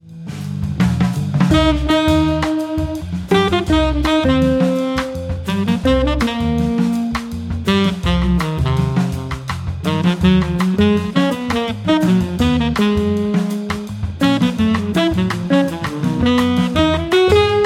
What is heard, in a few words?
Continuous Loop